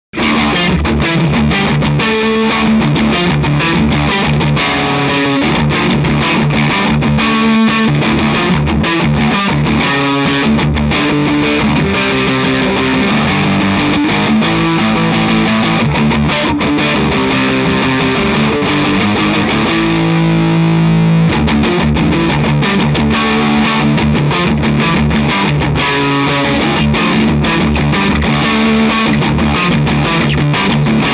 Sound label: Bridge Neck P Heavy